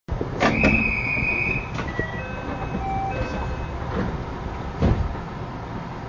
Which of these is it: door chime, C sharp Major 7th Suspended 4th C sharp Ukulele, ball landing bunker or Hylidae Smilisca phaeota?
door chime